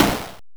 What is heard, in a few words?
Snare, Genesis; Langrisser